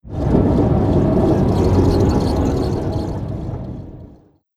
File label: slime tank flow closed; naxx